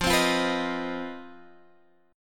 C Minor Major 7th Double Flat 5th F, chord

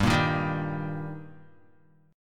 G Minor Sharp 5th G Guitar, chord; strummed; JGuitar

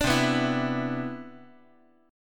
C Major 9th, C, down, chord

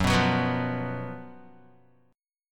Gb Major 7th Suspended 4th Gb Guitar; GbM7sus4; strummed; JGuitar

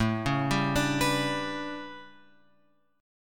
A 11th A, guitar chord; A11 Chord {5 4 5 7 X 7} arpeggiated

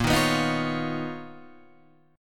B Major Sharp 11th Bb

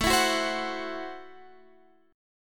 A Minor 6th A Guitar Standard; Am6 strummed; JGuitar

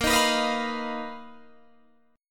B Major 7th Flat 5th A sharp, chord shape

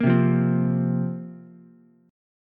C 7th Suspended 4th, Ukulele; strummed; C7sus4; 3,0,1,3

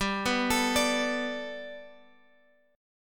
G Major Add 9th G down Guitar Open G

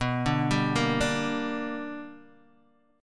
B Minor Major 7th Sharp 5th Guitar Open D

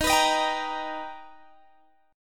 B Augmented Major 7th D sharp, chord

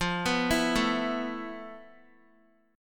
F Major 7th Flat 5th, F, down, Guitar; arpeggiated; JGuitar

